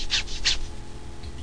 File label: Light Scratch